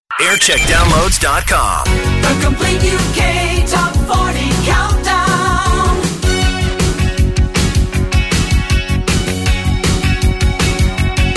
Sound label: Chart Show Bed; Radio 1; JAM